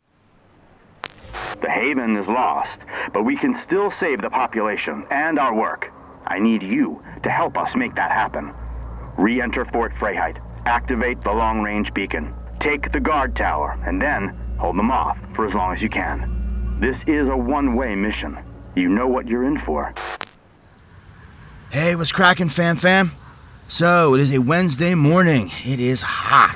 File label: video game montage